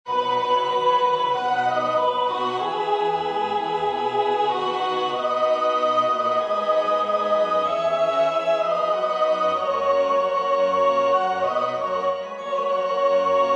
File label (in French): soprane; Exultate Deo; Haydn